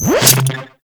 Minigame Begin; DE; Warframe; Grineer

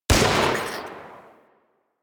Shot; Warframe; Infested

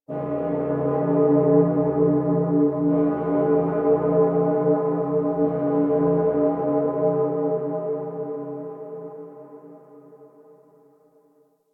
Church Bell Ring Clear; Dagath; Warframe; Ambience; Clan Dojo; Dojo Misc

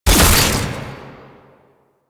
Alt Shot; Warframe; Fulmin Prime

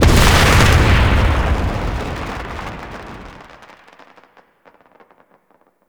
Egg Mine Field Destroy; Tau

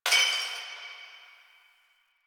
Distant Glass Breaking

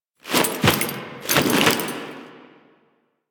Finisher Foley; Enemies; Finishers; Drifter; Warframe; Duviri; Dax

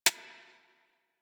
Decoy Counter Measure Mech

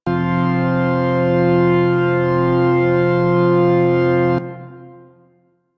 Organ F Note; Ambience; Orokin Moon; Warframe

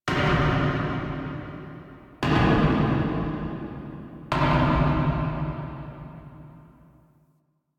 Knocks Small; Ambience

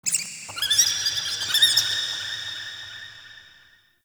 Small Critter Squeaks, Root Cave; Ambience; Neathuns Root Cave; One Offs

